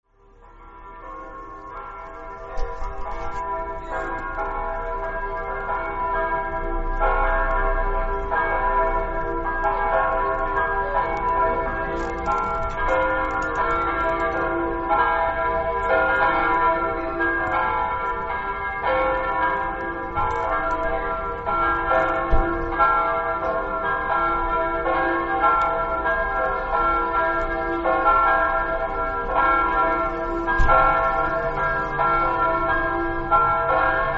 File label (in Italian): campane